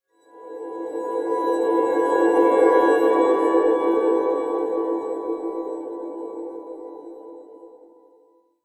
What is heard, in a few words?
Sting